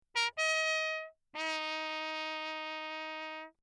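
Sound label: trumpet mute Bach signal; Perinétventiltrompete; Alessi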